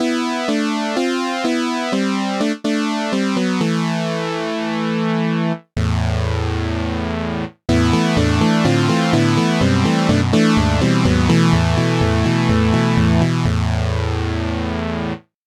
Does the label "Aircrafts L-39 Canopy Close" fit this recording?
No